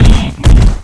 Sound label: run; cstrike; Frozen Terror